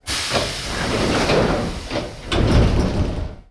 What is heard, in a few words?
Door Open, train; nankai6000; OpenBVE